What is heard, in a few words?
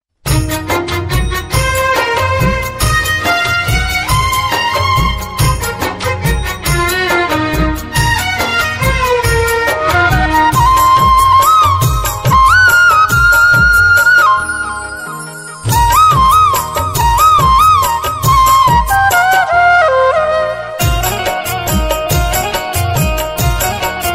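Instrumental Shyam Baba Ringtone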